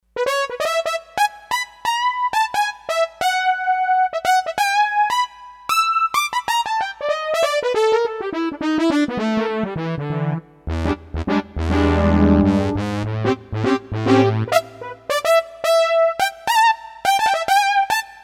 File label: Brassy Lead